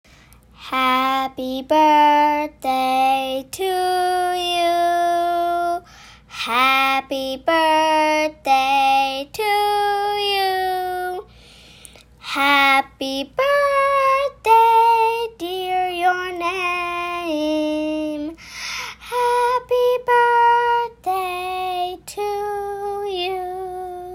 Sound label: Happy Birthday in F